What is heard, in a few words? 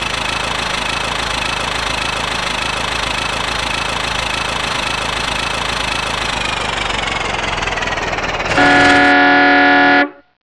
model t engine runs slows horn; laundry truck; sound effects and sound reinforcement